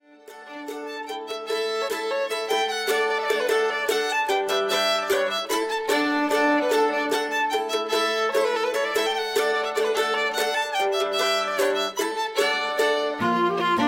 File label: Polkas